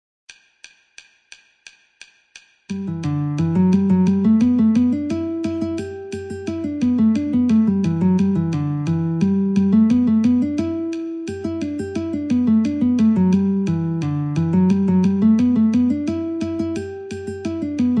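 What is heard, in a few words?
Guitar metronome 88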